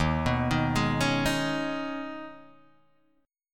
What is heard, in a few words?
Eb 7th Suspended 4th Sharp 5th, Guitar Open G; down